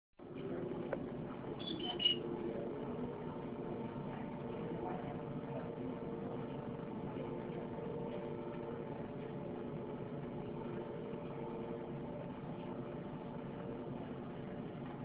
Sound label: Beeping; Location: my house